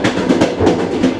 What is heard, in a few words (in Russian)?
stuk, train rail; Factorio Paranoidal mod; newtrainsfx